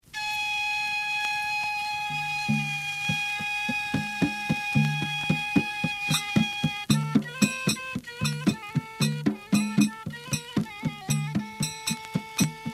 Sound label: flute